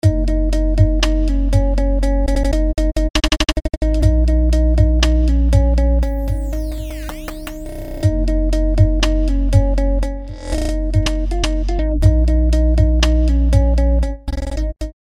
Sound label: iZotope Stutter Edit 2 Syncopated Rhythms